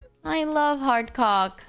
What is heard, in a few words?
me too clap